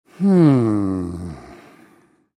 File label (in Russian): zvuk tyajelogo vzdoha muj